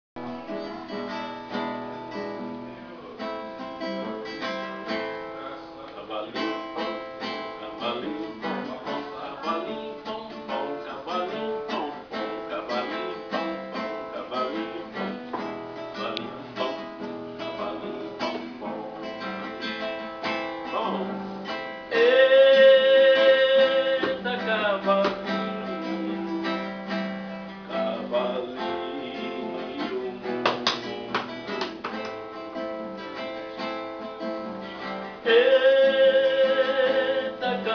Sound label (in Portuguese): viola musica infantil